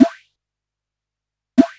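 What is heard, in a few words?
COO snare drum, drum loop; Big Fish Audio; Uncivilised G'rooves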